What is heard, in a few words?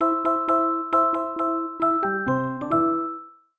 xmas jinglebells